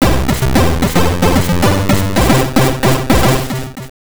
Race Start

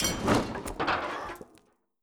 GateBreak Airport

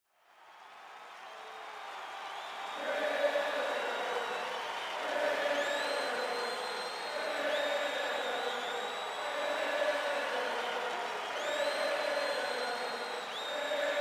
Misc Crowd Chant; mario strikers charged football wii gamerip 2007

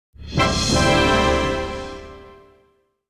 New Feature; super smash bros. ultimate expanded vol. 01 super smash bros.

